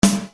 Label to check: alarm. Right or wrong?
Wrong